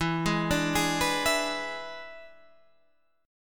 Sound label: E 7th E down Guitar Drop D